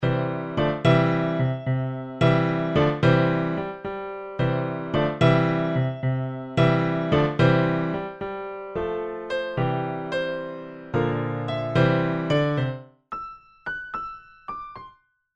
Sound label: Opening Theme Piano Midi; The Amazing World of Gumball